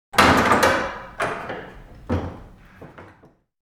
Wooden Window Shutters; Inquisitor 3

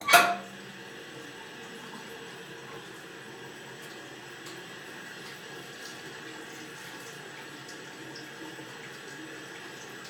Hospital Bathroom; Medical; Blastwave

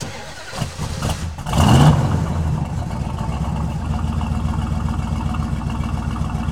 68 Road Runner Start Loop, Automobiles

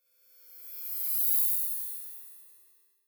Electric Buzz By Passing Thin Electric Buzz; Hollywood Edge; sound designer's toolkit v2

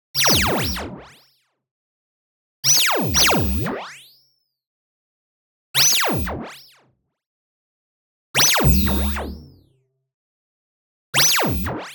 laser, oscillator and noise zap freq modulation; boom library; Anime Essentials

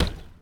Street Ball Glass Slap; SFX Kit

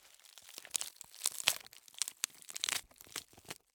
Cardboard, honeycomb, crush, rip, tear, pop; Eiravaein Works; Vaeyan IV

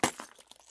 glass; Pitch Black - A Detective's Demise

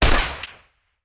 Rifle; Sound Ideas; Guns

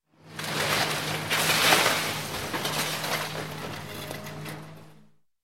Crash, Demolition Glass And Concrete; Sound Ideas 2000 Series

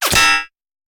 Bullet Impact; Sound Ideas; Guns

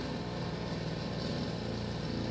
chain saw idle; devices accessories utilities; NS Studios - ultra sound collection

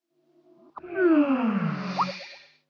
logout, skype

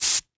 Hydraulics; 1001 Sound Effects

